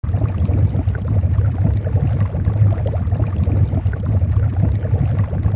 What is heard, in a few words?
water; de rats apartment